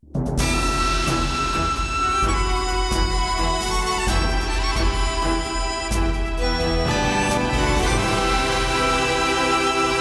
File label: launch